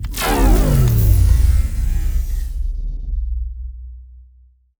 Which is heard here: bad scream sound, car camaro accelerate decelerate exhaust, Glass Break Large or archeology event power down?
archeology event power down